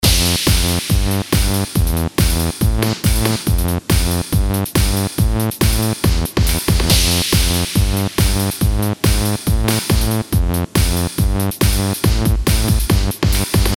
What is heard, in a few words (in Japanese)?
シンセ 音作り サンプル ベース サイドチェイン